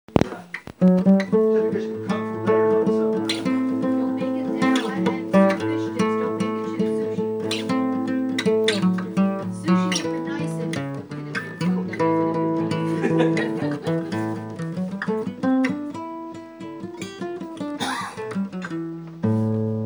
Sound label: lilttle riff